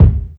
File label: rich lows kick single shot d sharp key; kick drum